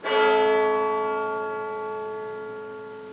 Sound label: bell